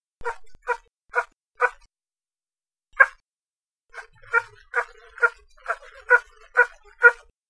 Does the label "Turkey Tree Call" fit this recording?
Yes